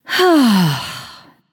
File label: sigh; smite; nuwa